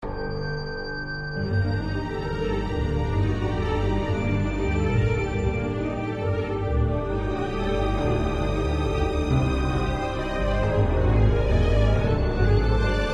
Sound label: Loop Tense; tales from the borderlands gamerip 2015